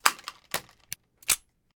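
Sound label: Weapon 9mm smg jam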